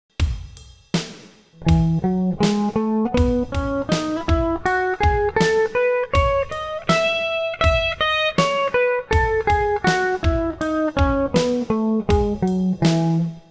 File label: e major 12th, scales